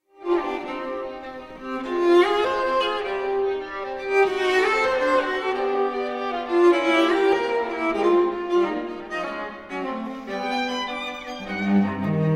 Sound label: Divertimento K563 in Eb major for violin viola and cello; Wolfgang Amadeus Mozart; 6th mvt allegro